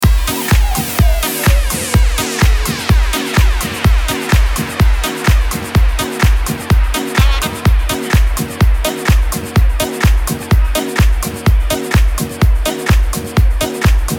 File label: Saxy Summer